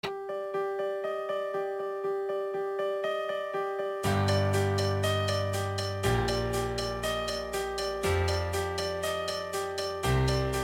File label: Great Piano Intro